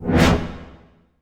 cinematic impact wipe

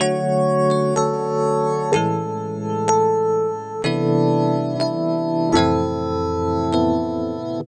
Ballads, Funk Keys; Sonic Foundry (Sony Creative Software); Synchro Funk

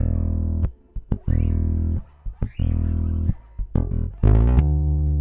Rock, Bass; Bass X

